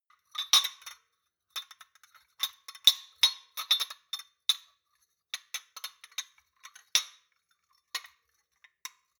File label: shot glasses handle long; berufe; gastronomie; soundpool